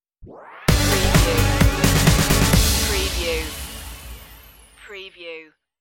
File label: glider stinger